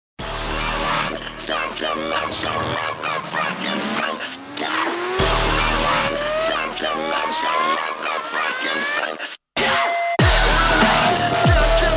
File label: music